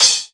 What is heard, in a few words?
Hat Splash, Hip Hop; Akai MPC500